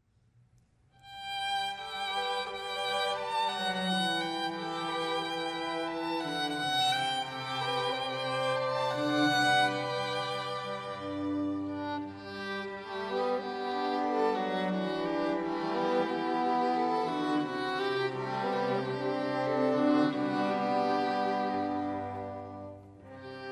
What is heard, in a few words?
String Quartet; Classical